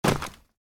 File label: taunt flip land